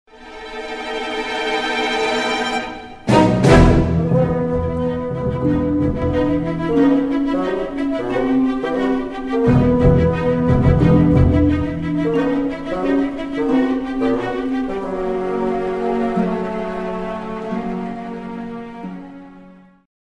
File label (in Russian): trevojnaya muzika